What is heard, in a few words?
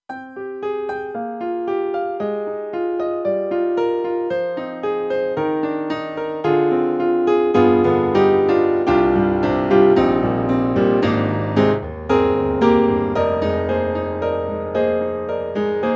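Stereo Grand 4-Way 2, piano; KORG M3 XPanded Program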